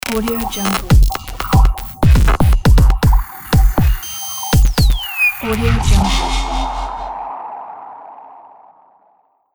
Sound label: abstract reveal logo